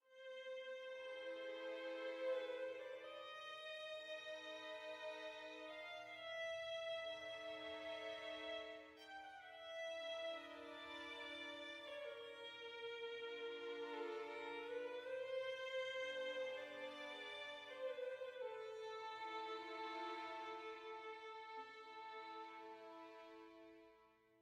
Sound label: dark soft